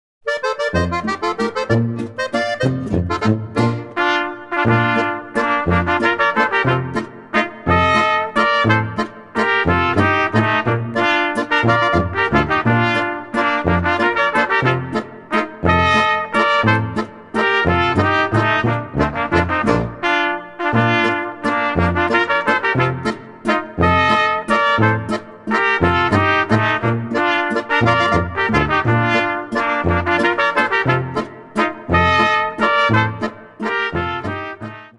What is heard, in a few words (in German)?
Volksmusik